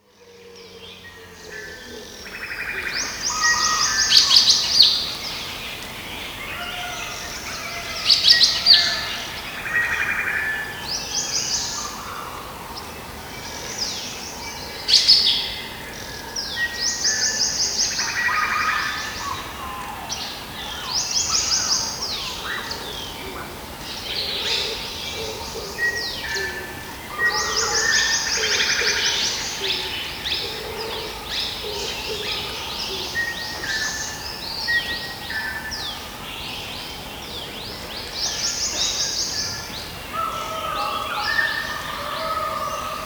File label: Hot Afternoon In the Rainforest; Dr. Sound Effects; 210 Nature Sounds - 20 Hours of Relaxing Natural Ambiences for Meditation and Sleep